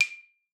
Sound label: D6, xylophone; Xsample VOL-4